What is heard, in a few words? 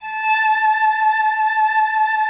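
cello vibrato, A5; Xsample VOL-7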